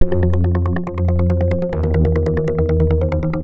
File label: Synth Poly; TSMtoolbox